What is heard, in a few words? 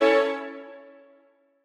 Strings